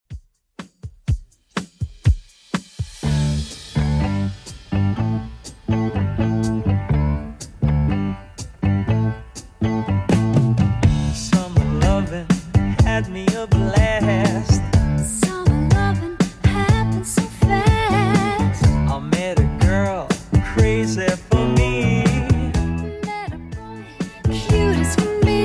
70s Redrum 123bpm

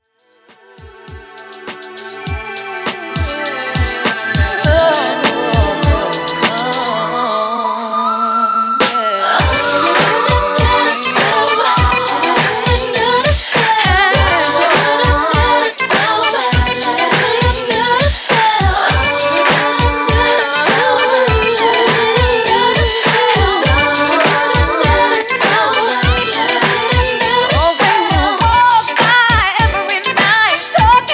Re Drum 101Bpm

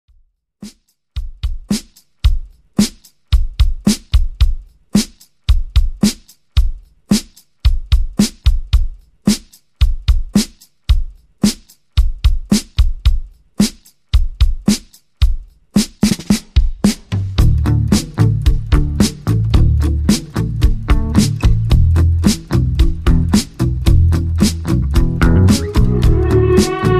111 BPM

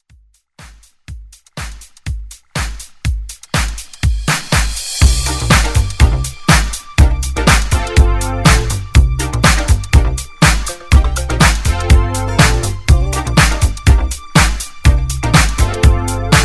80s Dance Redrum

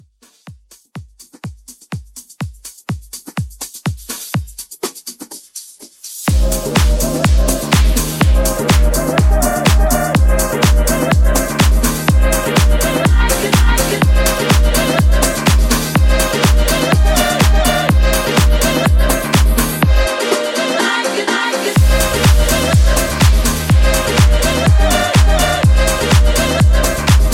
House Reboot, 124

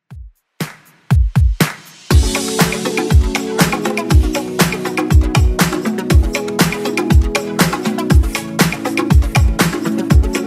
80s Redrum 120bpm

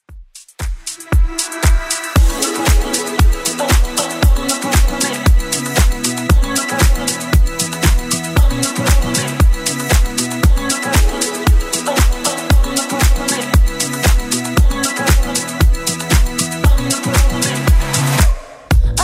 Top 40 Club Redrum 116 bpm CLEAN

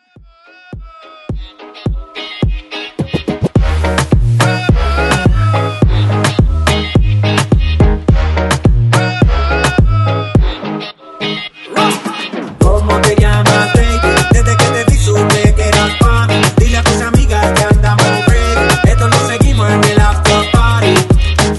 Moombah Mash Up

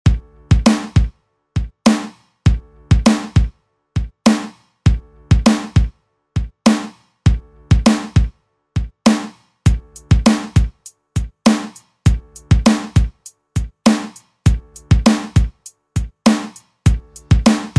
Rock Redrum

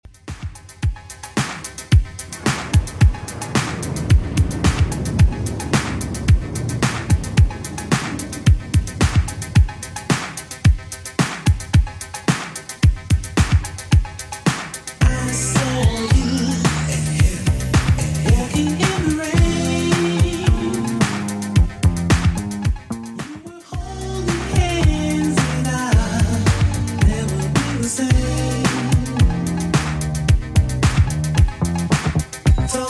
80s Redrum 110bpm

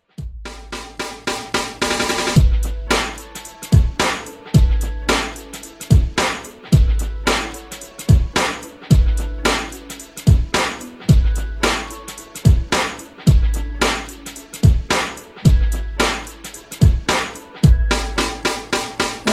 Top40 New Jack Swing; Redrum; 110 Bpm

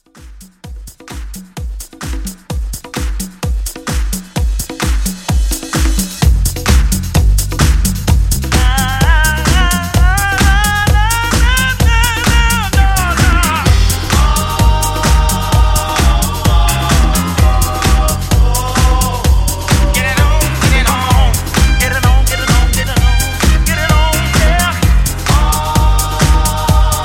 70s Classic Soul Quick Re Drum

